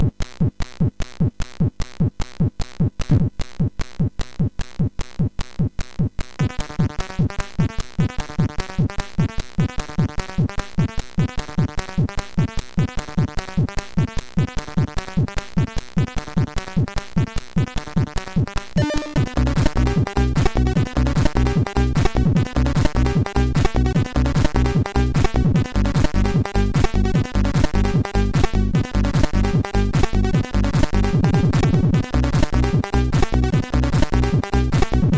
sid MOS6581R4

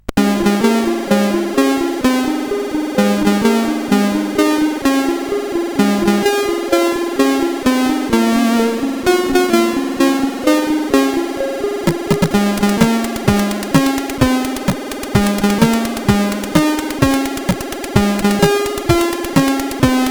Happy Birthday sid CSG8580R5; Happy Birthday Zirco

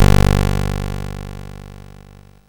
Frantic, MOS6581R4

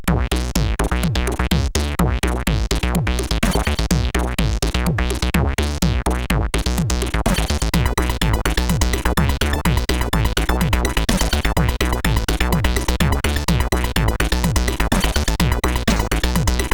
Aciddd, CSG8580R5

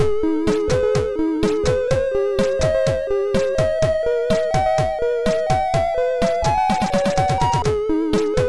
Music Imitation sid MOS6581R3

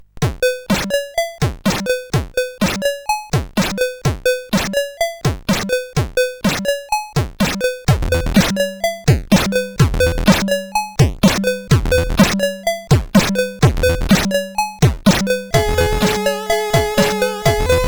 Jingle Rock, CSG8580R5

